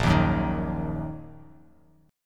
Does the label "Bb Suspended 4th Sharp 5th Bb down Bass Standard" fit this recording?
Yes